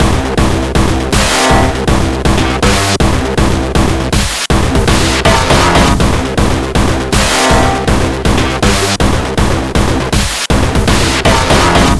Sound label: Beats Loop; Anger Foot Demo; Angerfoot Beats 07b